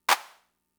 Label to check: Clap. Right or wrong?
Right